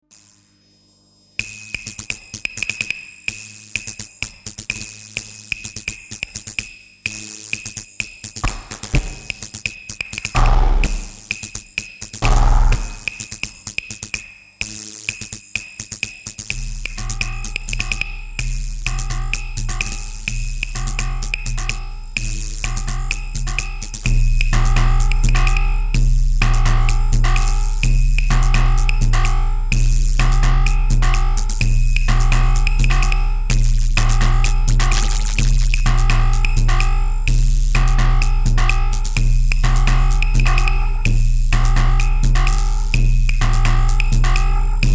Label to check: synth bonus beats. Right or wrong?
Right